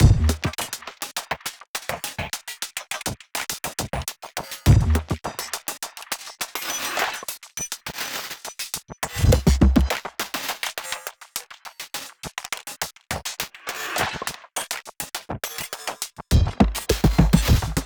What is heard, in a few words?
music drums Fight; music ftue drumsFight 07